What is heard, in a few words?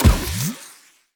tower demon Pit basic Attack